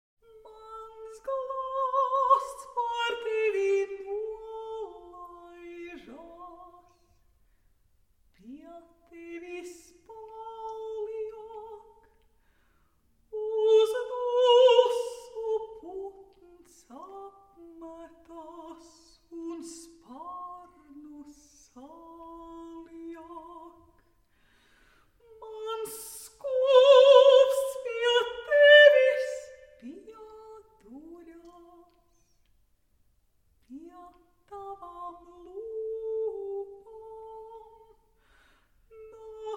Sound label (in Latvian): Cikls Solo balsij A Capella; Mezharaups Imants; Miilas Dziesminjas; Glaasts